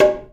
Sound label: DRUM; E-MU Producer Series Vol. 5 – 3-D Audio Collection; 3D Percussives; 3D Ethnics